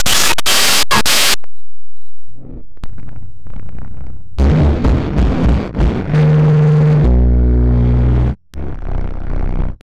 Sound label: orchestra swish hit